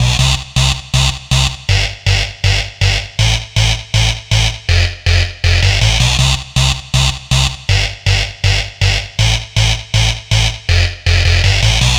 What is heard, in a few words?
Dance Synths, Hardcore Loops, Synth Loops; Best Service ProSamples vol.37